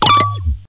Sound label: UI